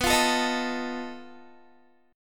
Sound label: B 7th Flat 5th B Guitar; B7b5; strummed